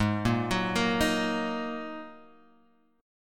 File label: G sharp 7th Suspended 2nd, Guitar, Open G; 6,3,4,3,4,x; down; chord shape